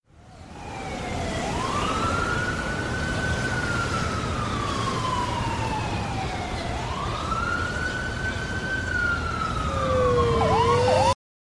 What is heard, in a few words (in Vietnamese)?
tieng cuu hoa